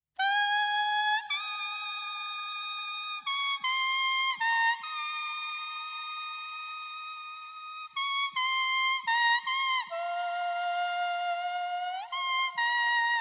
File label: grass whistle